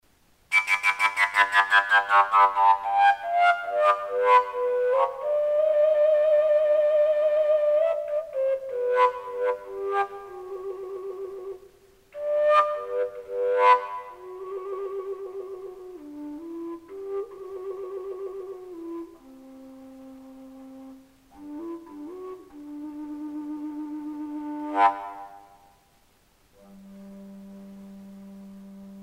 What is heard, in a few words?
equal tempered g, fujara; folkart slovakia; fujara huge flute; location recording